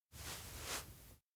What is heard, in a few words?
pull hem; jet; anemoi